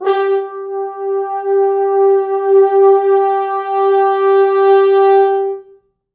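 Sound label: 4 French Horns, Horns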